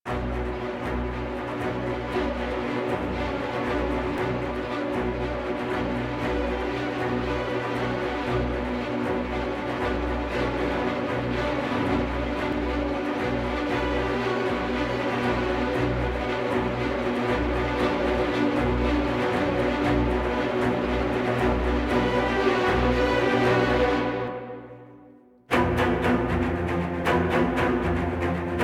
Hvy Blades Short Strings; Warframe; Dynamic Music; Grineer; Grineer One

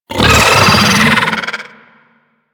Pet Vocal Angry

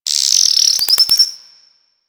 Cave Insect; Ambience; One Offs; Insects; Warframe; Quests; The New War; Drifter Camp

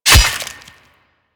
Melee Axe Impact Flesh; Warframe; Weapons; Axes

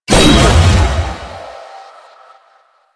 Dagger Proj Die; Duviri; Incarnon Adapters; Incarnon Okina Prime; Weapons

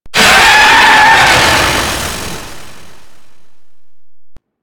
Golem Melee Head Attack Swing; Warframe

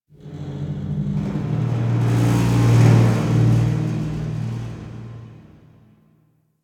Metal Rumbles; Warframe; Quests; TheNewWar; Ambience; Zariman

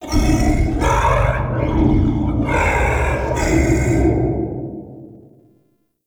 Root Cave Root Chatter; Ambience; One Offs; Neathuns Root Cave

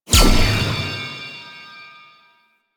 Wave Ability Cast; Enemies; Abilities; Warframe; Duviri; Dax Knight

